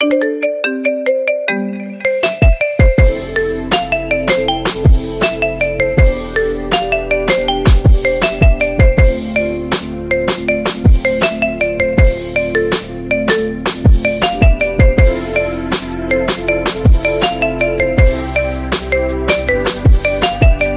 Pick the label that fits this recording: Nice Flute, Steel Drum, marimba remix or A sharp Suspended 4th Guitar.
marimba remix